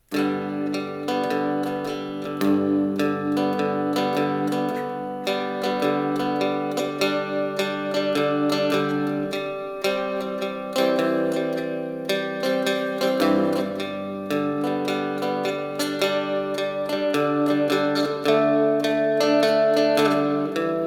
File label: blues g